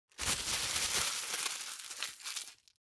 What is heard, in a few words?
plastic bag rumble quick; foley; smartsoundfx